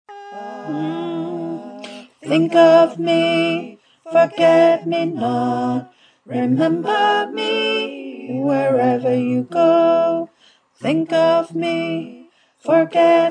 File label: bass